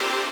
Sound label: lead